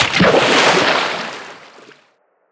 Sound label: Splash, Water